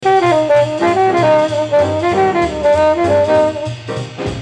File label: solo